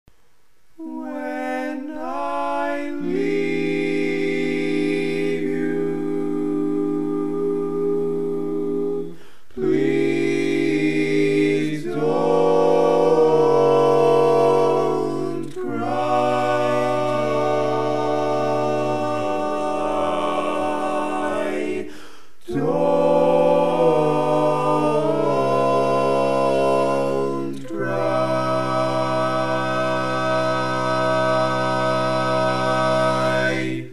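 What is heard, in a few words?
Baritone, barbershop; Arranger / Mac Huff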